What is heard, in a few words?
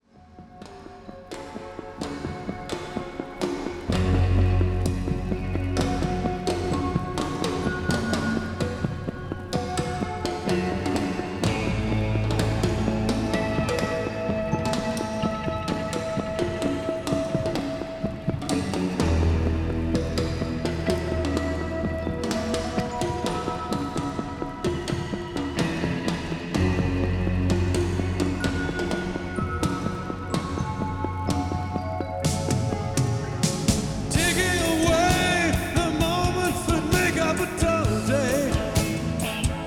digital 48khz; teac tn 100